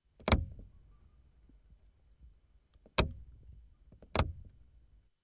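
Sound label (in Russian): включение света фар переключатель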